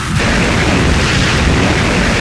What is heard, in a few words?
plasma shoot, cremator; hl2